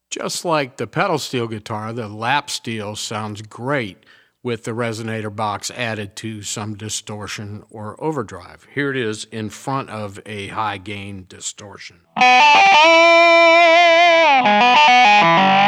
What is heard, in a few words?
resonator pedal, steel guitar